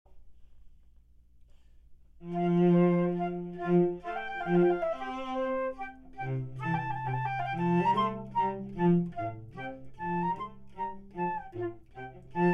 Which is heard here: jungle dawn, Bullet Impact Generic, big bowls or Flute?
Flute